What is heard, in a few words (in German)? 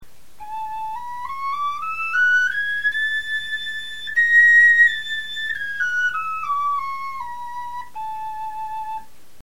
tonleiter, knochenfloeten